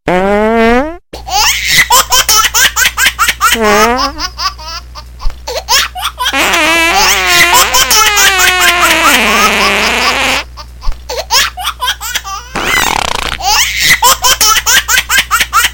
Fart Baby

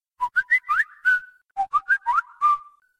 Whistle Sms Long

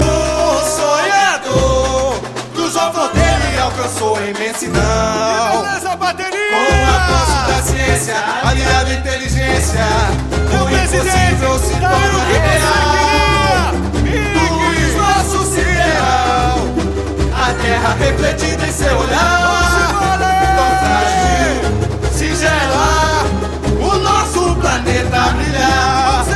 Samba; Mancha Azul 11

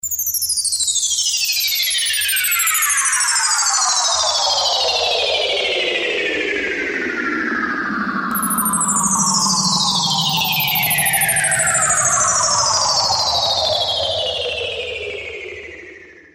Sweep Down

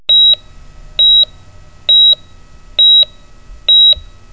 Heart Monitor

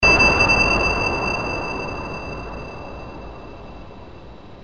Sms Ding

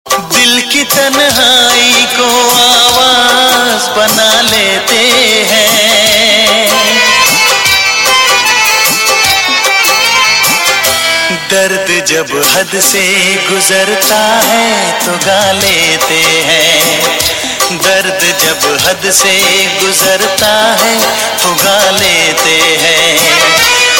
Sad Love Song

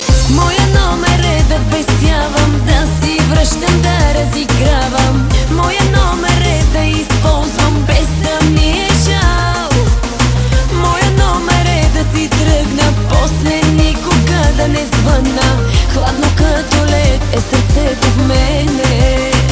Pop Folk

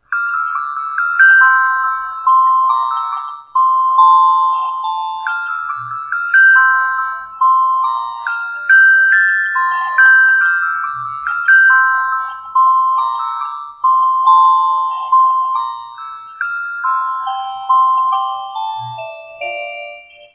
Musicbox

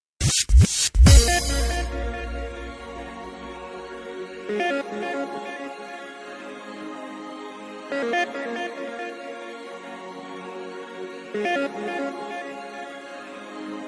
Russian Disco

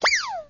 Zip

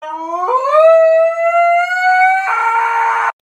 Husky scream

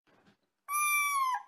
Squeak

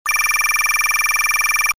Standard Ringtone

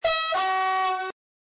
TGV

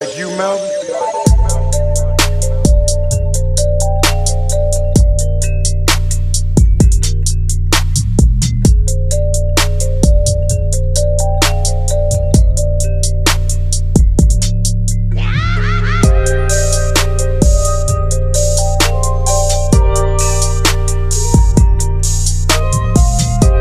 Song Remix

